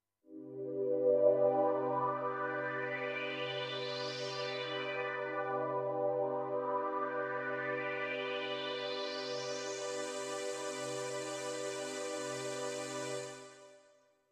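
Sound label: Sweep Pad, digital piano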